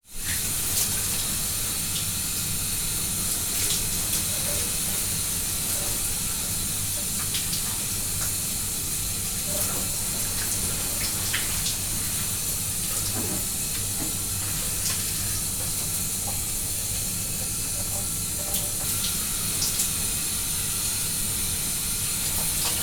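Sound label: household shower running big bathroom boiler in background farm house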